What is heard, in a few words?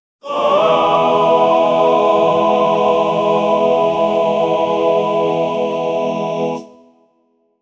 choir singing; cheering; happypack